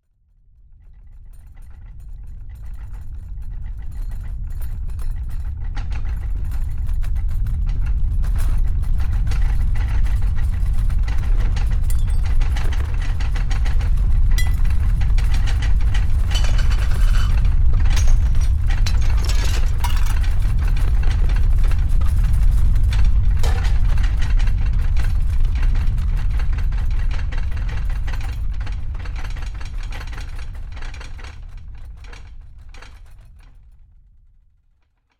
earthquake inside house objects shake rattle fall and break smash low rumble; nature